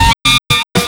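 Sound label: saxophone, BRASS KIT; Big Beat Samples & More; AKAI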